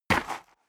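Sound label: step boots gravel light single left, footsteps; schritte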